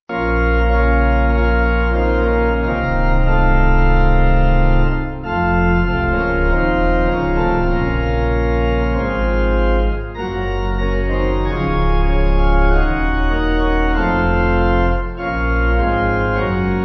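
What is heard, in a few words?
pipe organ; 4/Dbm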